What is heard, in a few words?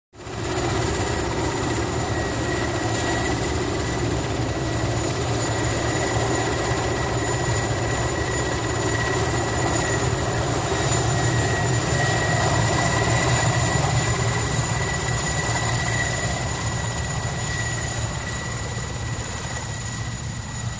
Helicopter Hover